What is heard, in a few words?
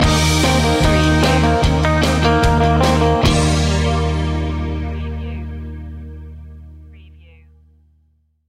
surfin stinger